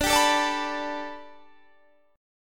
A 7th Suspended 2nd Suspended 4th

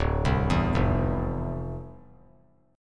D Minor 9th, down; Dm9; arpeggiated

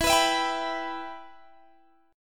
E Minor E

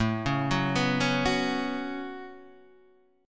A 6th Add 9th A down Guitar Standard; A6add9; arpeggiated; JGuitar

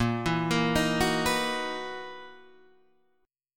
F sharp 13th A sharp

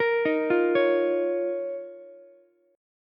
F 7th Suspended 4th Sharp 5th F down Ukulele; arpeggiated; JGuitar

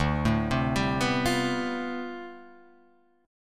D Minor 13th, chord; Dm13 arpeggiated; JGuitar

